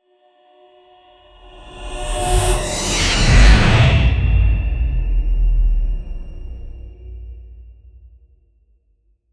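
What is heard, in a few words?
a slow motion reality warp, elements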